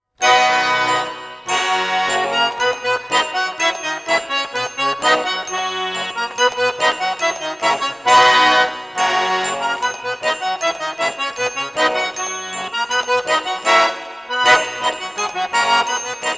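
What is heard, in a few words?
Russian folk music; barynya; muzyka dlya prazdnika v dou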